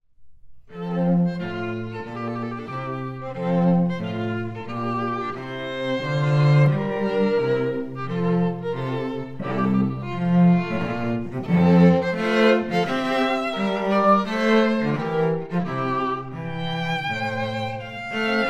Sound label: air from water music; handel